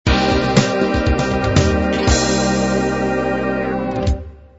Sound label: fatback groove end